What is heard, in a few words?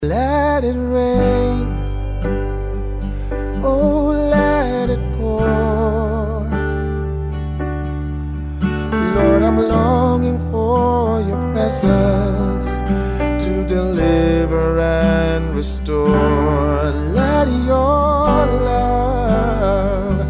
ring tone